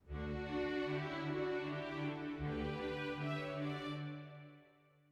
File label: ORCH piano; Haydn; Symph93